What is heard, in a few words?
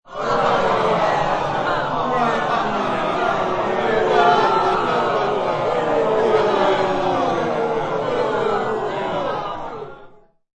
Crowd Complaining, Medium crowd complaining. Studio crowd unhappy, booing and complaining; dissatisfied; Crowds; LynneMusic